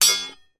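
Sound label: warfare sword hit another fight; zapsplat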